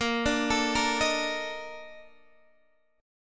Bb Major 11th Bb down Guitar Half Step Down

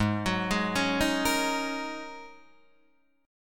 Ab 9th Suspended 4th Ab down Guitar Open D

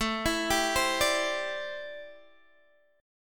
A Minor 11th A Guitar Open D

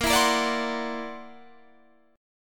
C 9th Flat 5th Bb

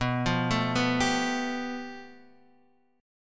A 7th Suspended 2nd Sharp 5th B, chord

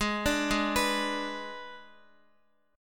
G sharp Diminished, Guitar; arpeggiated; x,x,8,7,8,7; 4F,4A,5C,5G,5C,6E

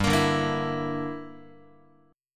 G Minor 6th, Guitar; Gm6 strummed; JGuitar

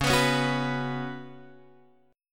C sharp 7th Suspended 4th C sharp, Guitar Open G

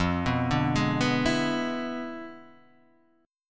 E 7th F, guitar; arpeggiated; chord; JGuitar

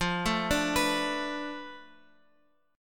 F 6th Flat 5th F down Guitar Open F, chord; F6b5 arpeggiated

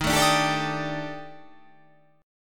D sharp Major 7th Flat 5th D sharp, chord; chordshape; 23,24,24,24,21,21,21,24

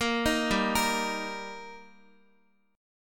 G Minor Sharp 5th G Guitar, chord; Gm#5 arpeggiated; x,x,8,8,7,5

